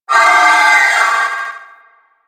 Trooper Attack Long, Vocals

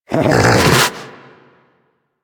Snow Critter Vocal Alerted; Warframe; Hunting Critters

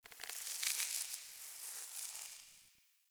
Foliage Growth G; Powers; Fey Eldveil; Eldveil Stillness

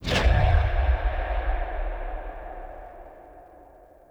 Health Critical, Warning Stingers; Player; Envoy

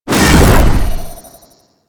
Teleport End; Warframe; Captain Vor

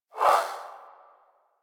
Swing, Melee; Warframe; Weapons; Modular Melee

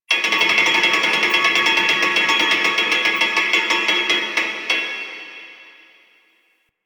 Grid Loop; Warframe; Entrati; Vaults

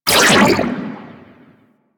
Fire, Weapons; Warframe; Tenno; Vectis Prime

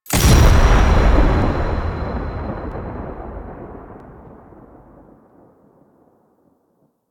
New Player Warframe Select Menu Confirm; UI; New Player Intro

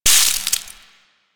electricity trap loop stop; Venus; Warframe; Veilbreaker; Quests; Kahl

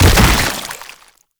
Bodyfall Light; Enemies; Warframe; Proto Infested

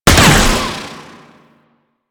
Shield Lancer Pistol Alt Fire; Warframe; Lasrian; Lasrian Soldiers; Weapons

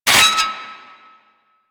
Bird Three Scratch; Warframe; Ambience; Entrati

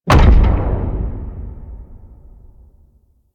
Far Step Default; Warframe; Corpus; Arachnoid Hunger; Steps

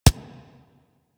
Weapon Punch SMG

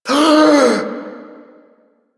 friendly Grineer revive complete gasp; Warframe; Veilbreaker; Quests; Kahl

